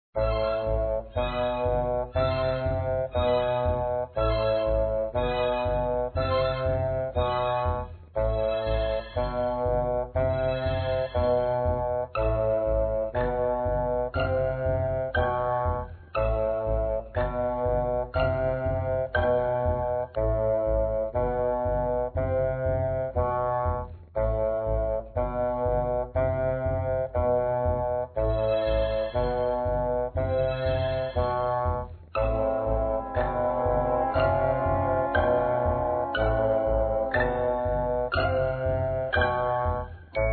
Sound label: music Loop Game; lantern jack